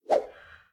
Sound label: auto fire; smite; xbalanque